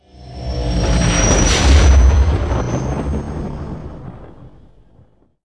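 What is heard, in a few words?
item hyperspace enter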